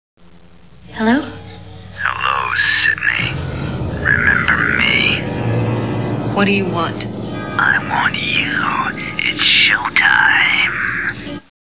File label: scream